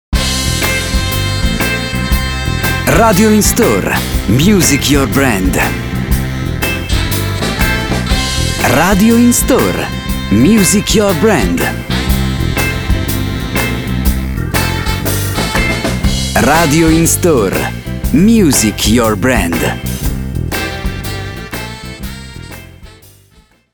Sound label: Soul